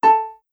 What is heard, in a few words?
Rhythm Whoops; super crazy rhythm castle